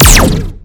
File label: weapon; TSH Type 105